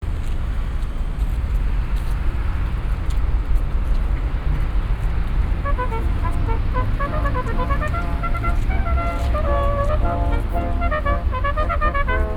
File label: Brass Ensemble Wittenbergplatz Berlin; Sound Scapes